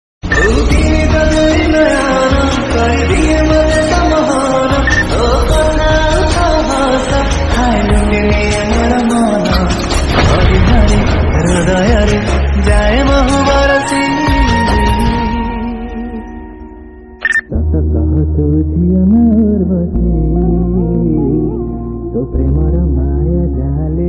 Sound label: Odia Love Song